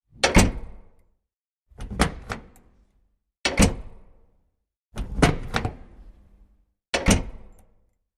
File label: Door Metal Armored Open